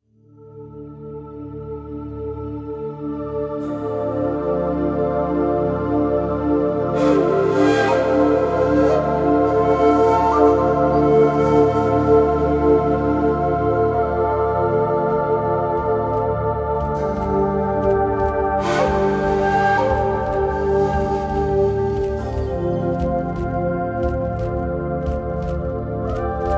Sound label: drum spirit